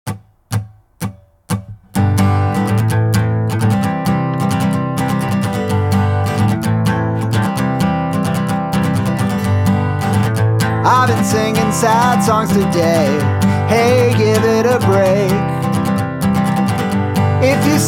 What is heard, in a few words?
Acoustic